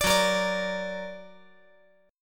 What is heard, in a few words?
Gb Augmented